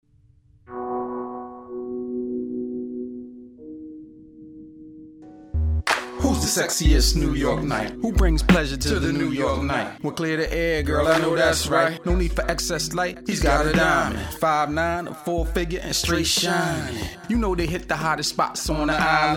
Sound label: Acapella